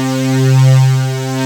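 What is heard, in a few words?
Wide open synth; Best Service Gigapack 1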